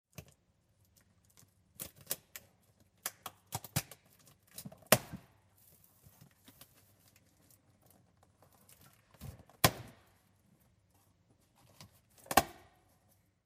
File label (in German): Karton oeffnen